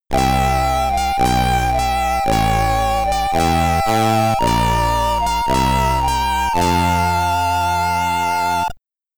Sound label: SID Stereo, commodore 64; Moledavia